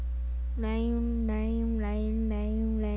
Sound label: rain